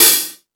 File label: Space Drums, E-MU Pro-Cussion; drum machine